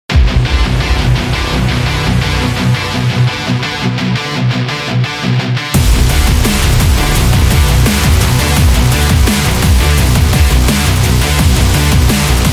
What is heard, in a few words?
Extreme Sports Action